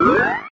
fish fire buff

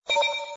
slot end

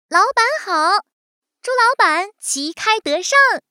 logon music

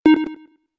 clock ring

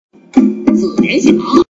sound water compare point

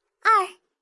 countdown 3

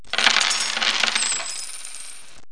win gold

